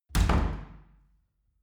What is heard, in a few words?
wood door old reverberant